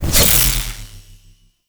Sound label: Venus Fishing Spear Throw; Weapons; Warframe